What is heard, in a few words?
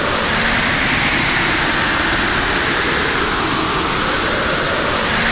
mine drip